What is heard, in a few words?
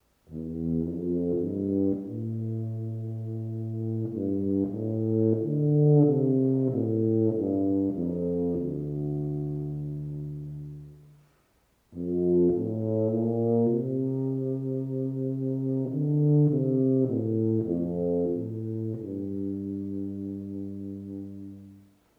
tuba